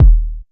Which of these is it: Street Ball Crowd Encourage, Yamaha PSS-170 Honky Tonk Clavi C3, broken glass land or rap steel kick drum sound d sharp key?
rap steel kick drum sound d sharp key